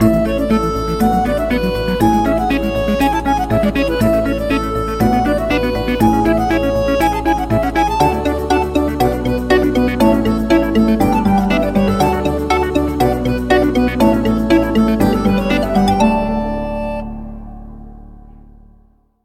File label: Accordian